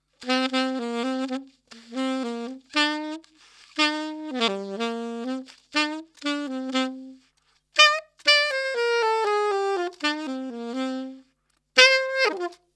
Saxofon solo 1m Abstand; Sennheiser MD Serie MD445

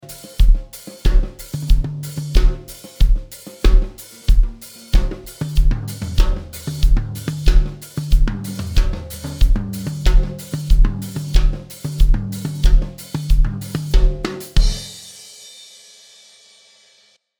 Summe 1 Multiband Dynamics

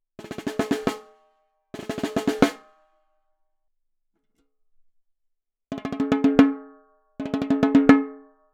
Snare record mit M-Audio Nova

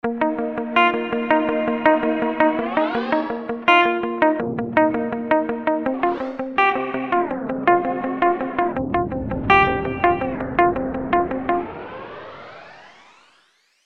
Gitarre Morphing FX Glide Modulation

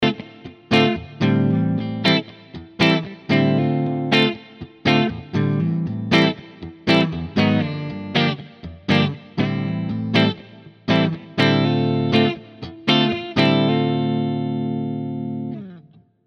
Les Paul 60s Beide Pickups Clean

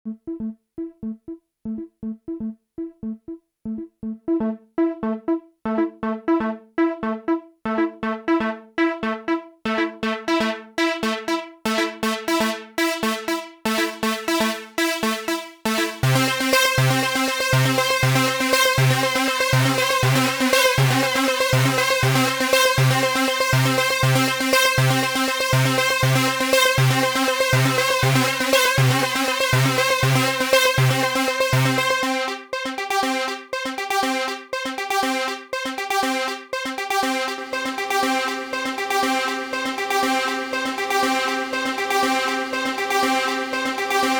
JunoDS88 JP8000 Brs FS Filter EXP Sequenz

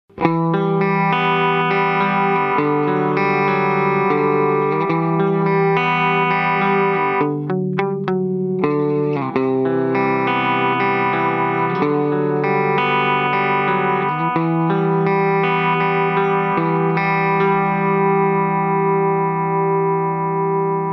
Compressor Plus mehr Kompression; Keeley

